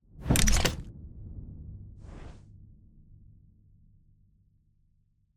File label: case open start